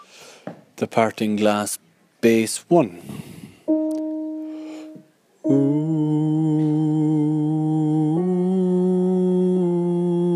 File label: Bass; The Parting Glass